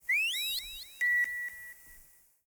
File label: electronic beeps alien birds chirps; prosoundfx